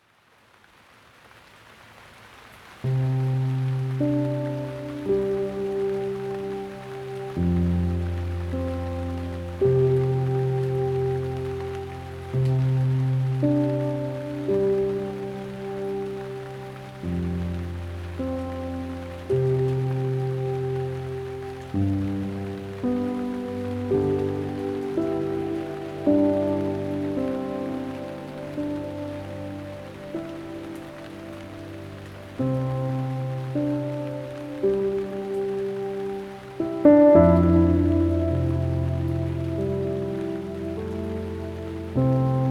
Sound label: with rain, piano